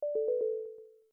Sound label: verification rejected